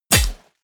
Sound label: bullet large impact metal; krieg-waffen; geschoss